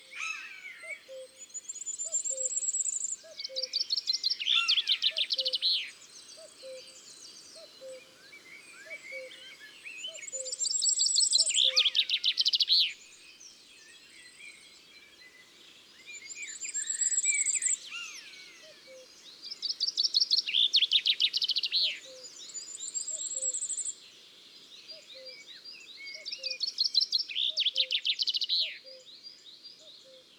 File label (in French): Geai des chenes, Chants d'oiseaux des bois et des forets